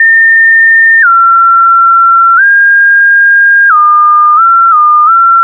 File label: SYNTH LEAD; Keyboards; Leads